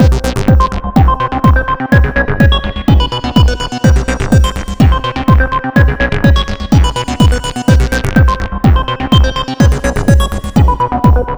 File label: Square Flex; 100 acid vol 1